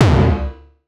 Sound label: Kick, Gated Reverb; creative gate; musicradar